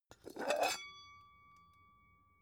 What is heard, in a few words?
pan open soft